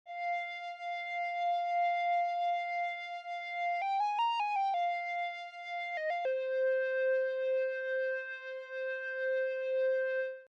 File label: Lead Synth